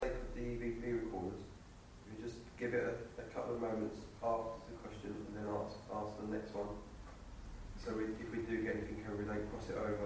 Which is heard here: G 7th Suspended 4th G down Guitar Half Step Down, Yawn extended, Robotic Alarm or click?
Yawn extended